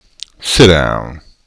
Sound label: sit down